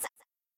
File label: girls; cheer Readers